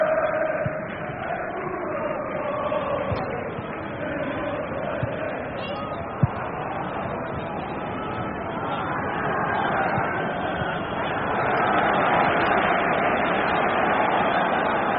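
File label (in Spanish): sonidos de estadio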